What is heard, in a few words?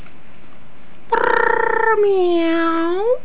hawk meow